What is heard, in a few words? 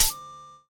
Hihat; Nova Sound